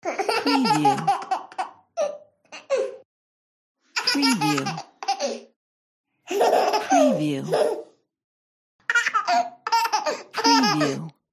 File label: sound baby laughter